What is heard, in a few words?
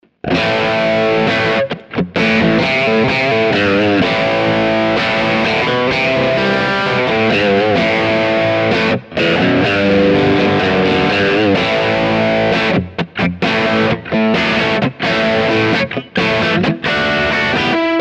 T System Crunch Bridge; EMG